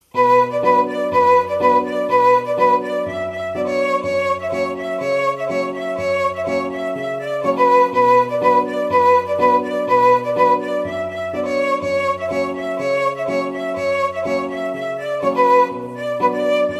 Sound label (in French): violon